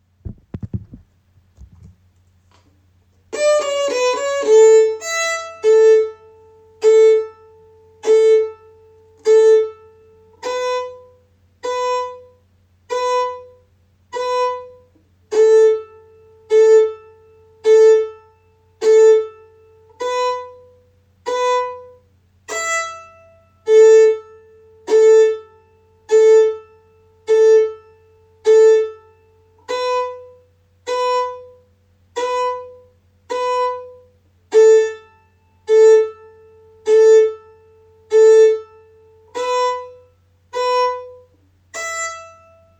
Devils Dream Chord Easy

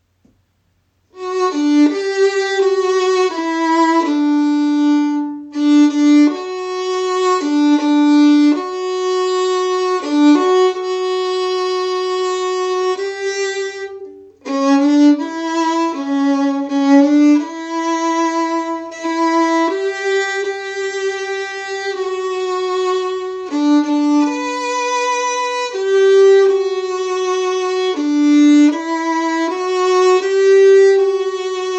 Lullaby Harmony

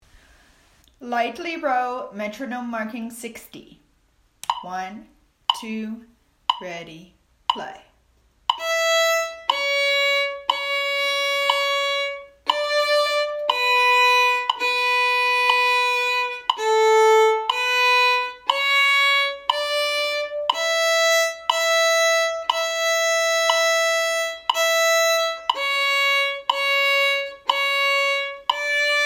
Lightly Row Met 60

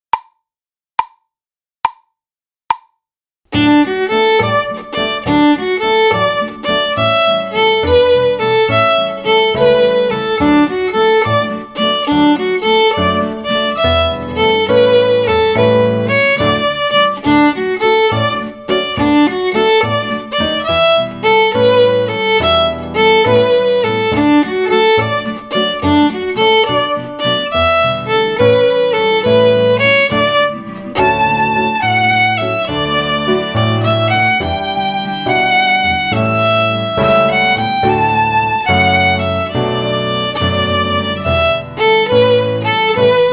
Keegans Jig Fast

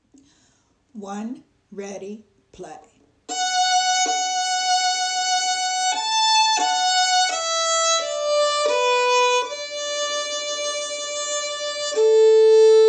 Smokey Mountains C

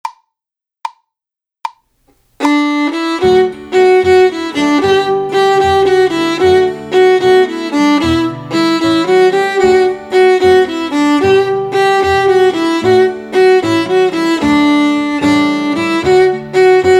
Short Bow Jig Fast

